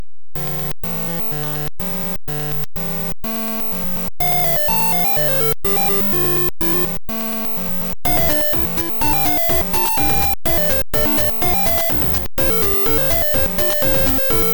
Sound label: z80; tilem